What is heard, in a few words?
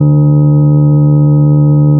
additive from cello single